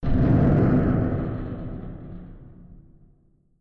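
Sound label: Collision Interior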